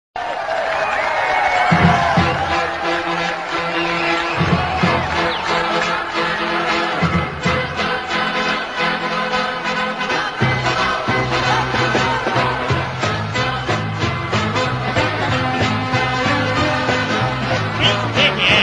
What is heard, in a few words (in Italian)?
sigla; alto gradimento